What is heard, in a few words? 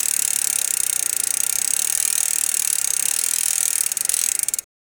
super bike, motorbike